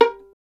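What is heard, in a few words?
STR violin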